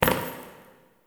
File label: Idle Single Step; Tagfer; Warframe; Ambience; Entrati